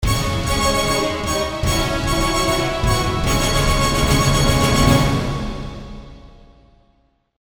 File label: Water Rising Stinger; ratchet clank into the nexus